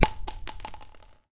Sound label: spread gun casing